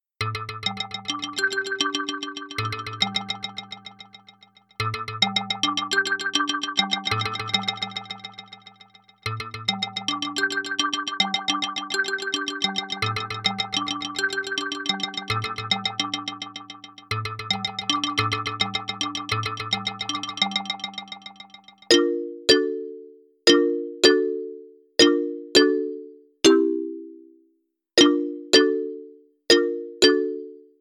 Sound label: modernist xylophone